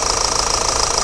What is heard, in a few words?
ford focus, Vehicles